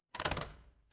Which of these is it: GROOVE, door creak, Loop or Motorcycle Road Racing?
door creak